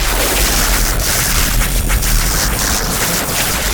static link loop; Dota 2

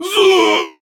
death

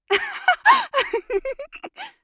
Mage Laugh; Realm Royale

